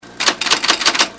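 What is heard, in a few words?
typewriter s; machine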